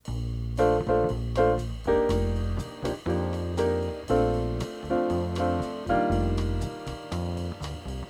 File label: Bb loop; Aebersold